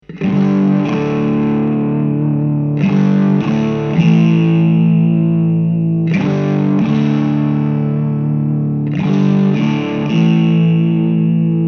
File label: vox gain full